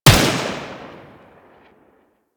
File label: Tommy Gun Shot Initial; Weapons; Warframe; Tenno